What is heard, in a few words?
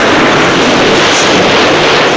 snowmobile tree pass loop